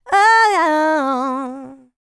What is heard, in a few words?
LICKS, Vocal Planet R&B Dance; Spectrasonics; LT R+B